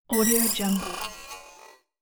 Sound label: scifi robot harsh function beep